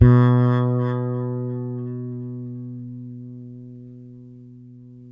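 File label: fretless bass; Spectrasonics; Bass Legends; 6-STR FRETLS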